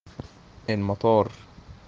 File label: airport